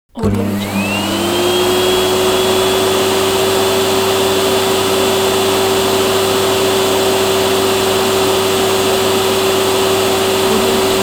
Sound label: vacuum cleaner